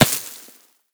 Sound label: sand impact bullet; physics; surfaces